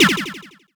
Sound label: pon